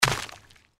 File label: giant rat land; npc